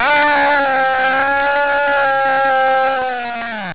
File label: scream